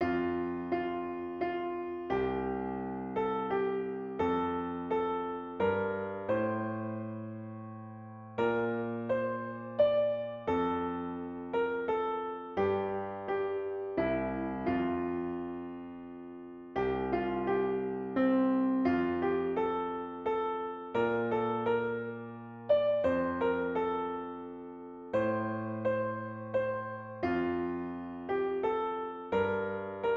ふるさと（ピアノ）

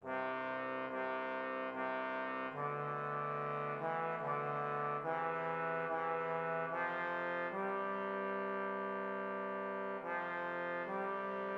トロンボーン ふるさと

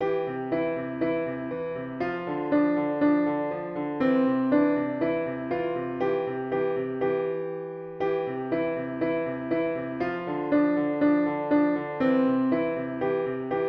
ちょうちょ（ピアノ）

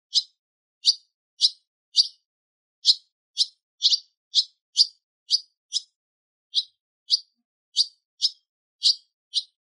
ツバメ

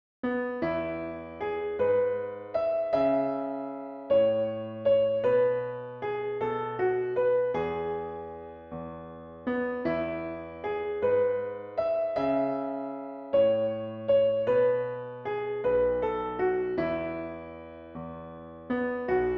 早春賦（ピアノ）

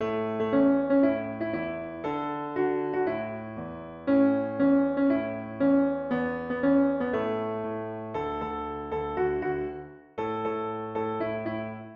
箱根八里（ピアノ）

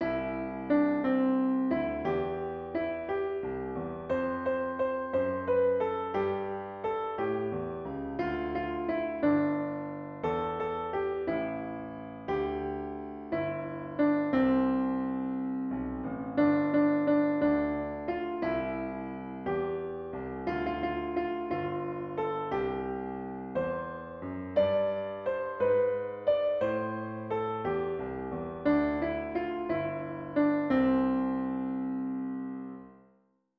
思い出のアルバム（ピアノ）

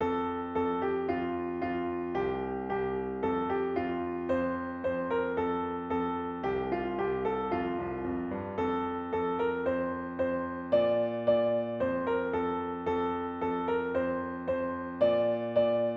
むすんでひらいて（ピアノ）

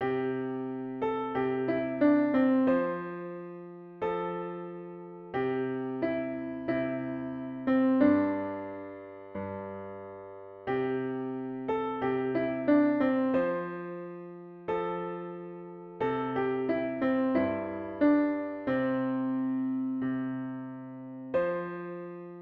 主人は冷たい土の中に（ピアノ）

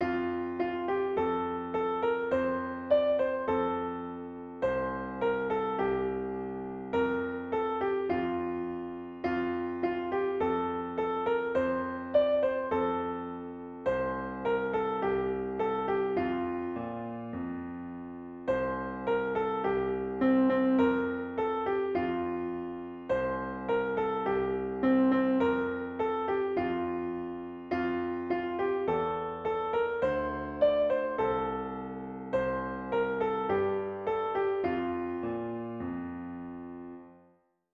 ロング・ロング・アゴー（ピアノ）

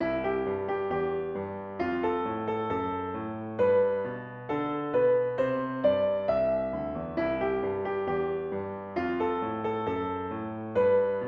茶色の小瓶（ピアノ）

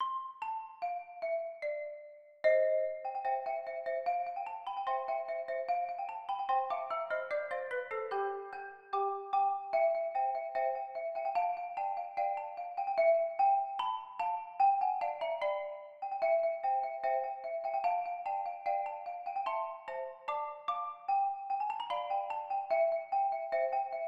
おもちゃの兵隊の行進（シロフォン）; シロフォンver.

